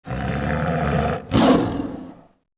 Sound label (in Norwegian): tiger; dyr